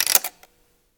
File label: device turn on; 摇钱树; ITEMS